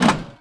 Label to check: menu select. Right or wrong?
Right